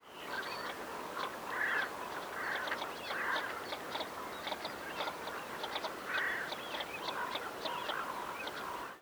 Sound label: northern shoveler spatula clypeata; putni liepajas ezera lauku iela